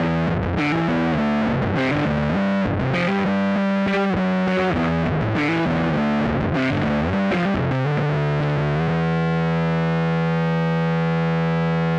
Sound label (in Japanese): FUZZ スラップ